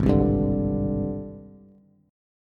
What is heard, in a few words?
Ab Major Sharp 11th Ab, Bass Bass Standard; AbM#11; strummed; chordshape; 4,3,5,7; JGuitar